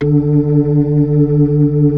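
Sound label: B-3, Hammond B-3 organ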